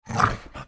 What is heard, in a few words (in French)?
attaque, monstres; deadmaze